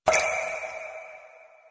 Single Water Drop

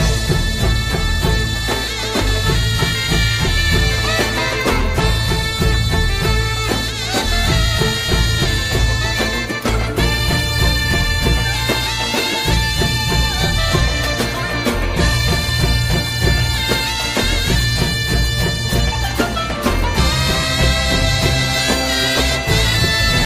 Egyptian Music

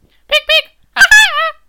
Pik pik hahaha

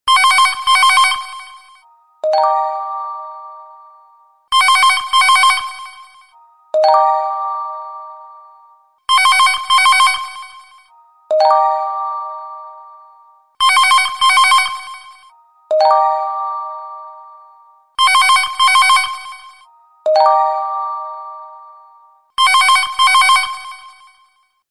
Telephone Ring

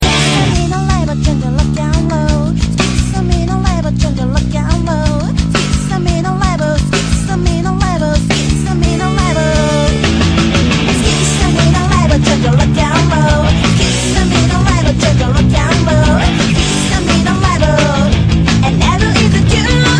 Rock

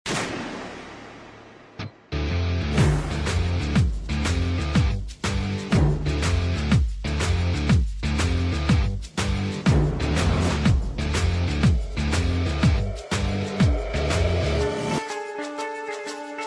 Background Score

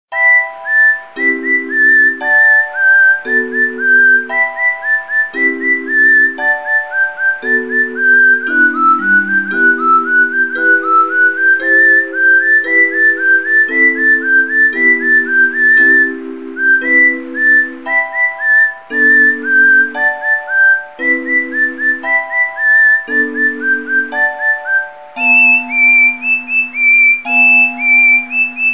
Whistle Song

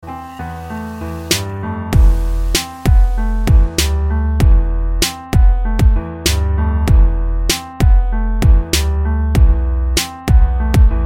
Freestyle rap beat

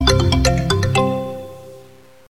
Obtain Rare Item; Mhf

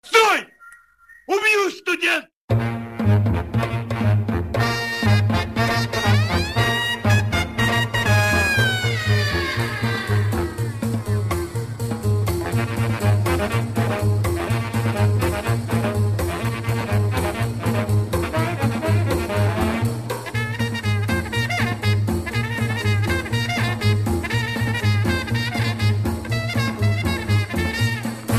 Old russian movie

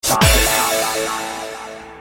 Disco

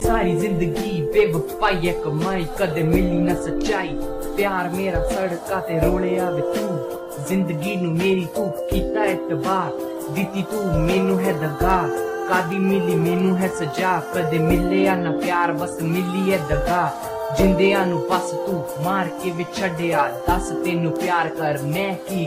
Punjabi

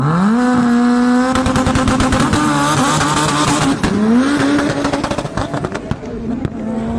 Honda Burnout Civic

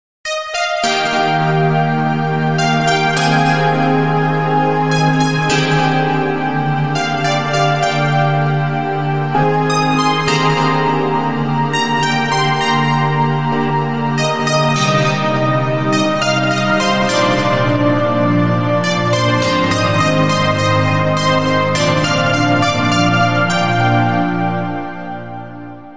Sad Piano

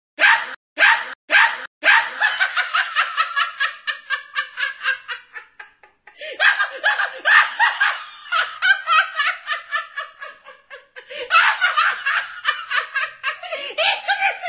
Clown Laugh

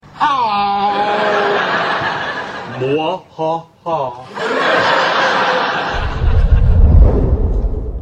Muhaha

